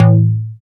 Synth Bass, Roland

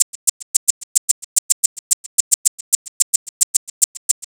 Dreamy Shaker 110; Percussion Elements

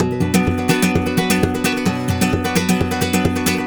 130, 130RUMBA2, Spanish And Gypsy Traditions; Soundscan vol.59; AKAI

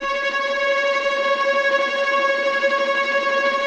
TREM F, Orchestra; AKAI Advance Orchestra Upgrade 97 Vol.1

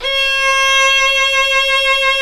Synclavier Strings; ILIO

